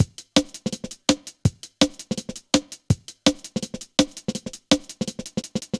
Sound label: Skippy, Drum Loops, Jungle